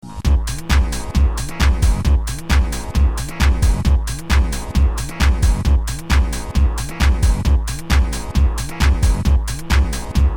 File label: Clavichords